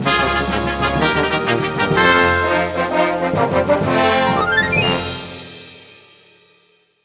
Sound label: Level Complete; Enchanted Portals; Xbox Series X/S